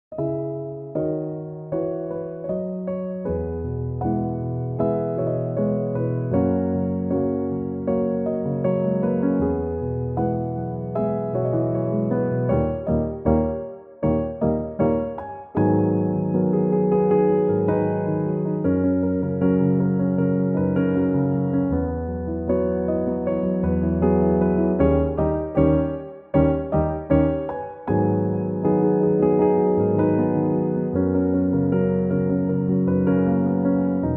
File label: piano instrumental